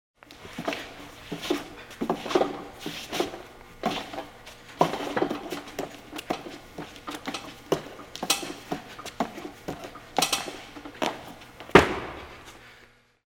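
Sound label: gymnastics pommel horse exercise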